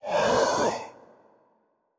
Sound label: Scream; Sarco Horus Eye